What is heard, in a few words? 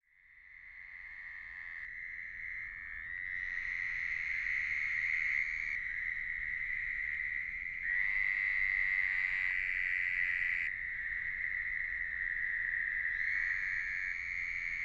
hybrid toad American toad x Fowler's toad